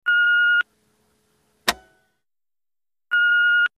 Answering Machine

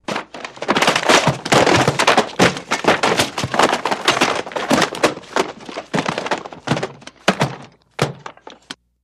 Clumsy Crashing

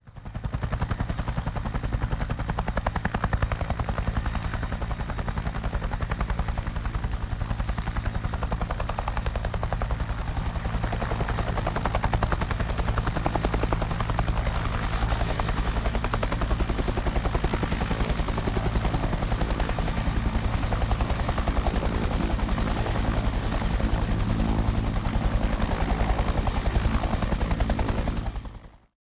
AH1 COBRA IDLE

